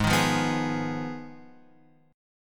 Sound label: Ab Minor 6th Ab, down, Guitar, Open F; strummed; JGuitar